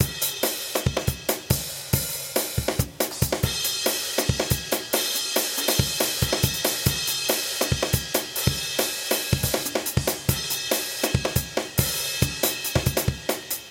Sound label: drum